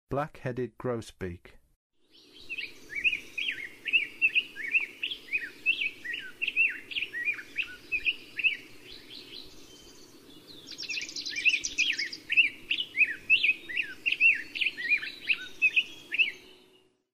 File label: Black-headed Grosbeak, Western USA Songbirds; North America